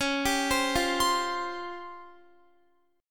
Db Major 7th Flat 5th, Guitar; arpeggiated

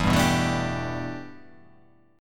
E-Minor 11th, Em11 chord; strummed; JGuitar

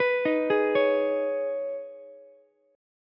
Eb 7th Suspended 4th Sharp 5th, Ukulele; Eb7sus4#5; arpeggiated; JGuitar

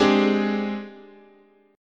Ab Major 7th Flat 5th, guitar chord

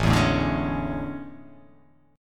A Major 11th, down; AM11; strummed; JGuitar

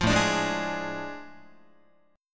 A Major 7th Suspended 4th Sharp 5th, down, Guitar; AM7sus4#5; strummed; JGuitar

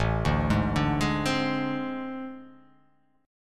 A Minor Major 7th Double Flat 5th, down

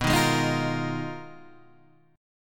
G sharp Minor 6th Add 9th B, guitar chord

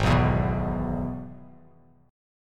Ab Minor Sharp 5th Ab, Bass Standard; strummed; 4,2,2,4

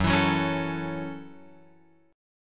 Gb Minor 7th, Guitar Open F; strummed; JGuitar

